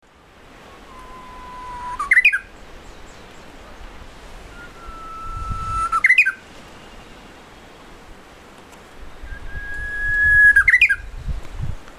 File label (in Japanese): uguisu